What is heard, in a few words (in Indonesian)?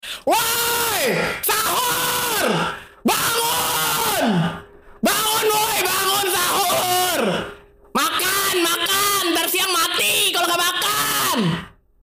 nada dering alarm paling keras